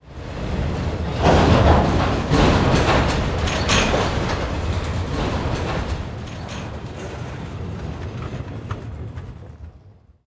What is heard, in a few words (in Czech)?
vagony rozjezd; Mafie 1